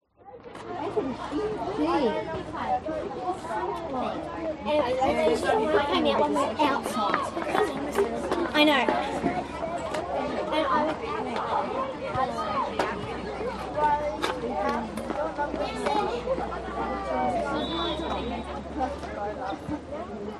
sport soccer game school competition stand small amb parents children cheer clap; chatter